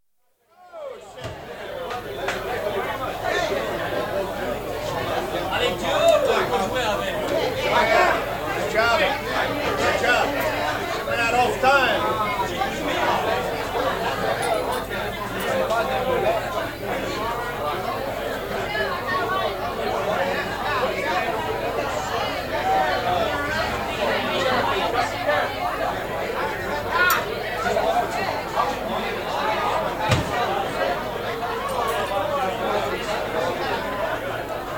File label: Mamou: Bar atmosphere; Bars and Clubs (Sound Effects:Backgrounds); BBC